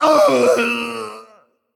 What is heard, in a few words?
Male Demon Death